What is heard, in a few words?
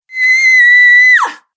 Female Scream Shriek; Screaming Sound Effects